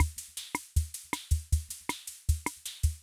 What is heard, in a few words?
Loop, rhythm king; classic machines